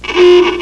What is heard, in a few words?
OLD HORN